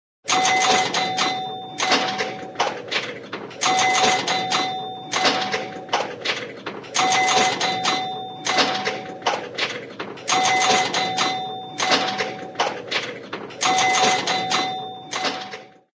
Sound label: pinball, old pinball machine playing; cartoon